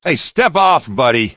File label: shot